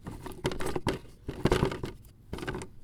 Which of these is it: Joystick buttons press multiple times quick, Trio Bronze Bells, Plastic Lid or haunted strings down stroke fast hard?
Plastic Lid